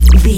sci-fi electric pulse hum

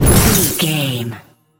ice blast projectile spell

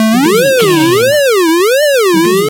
retro synth wobble

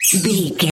voice fun mouse high

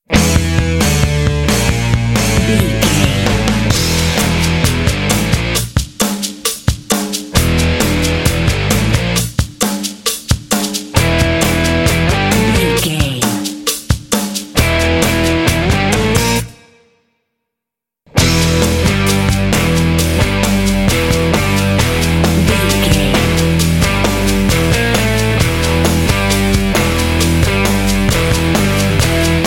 bed mix

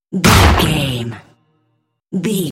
cinematic stab hit trailer

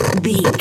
radio tv electronic static

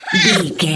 goblin fairy attack low

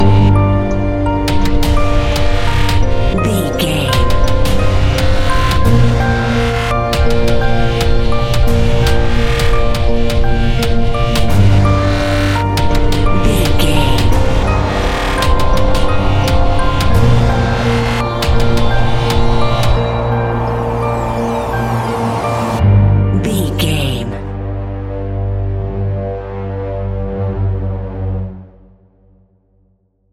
echoes modern horror

